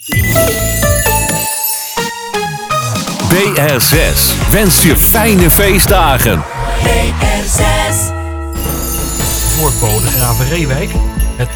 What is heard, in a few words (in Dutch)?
kerstvormgeving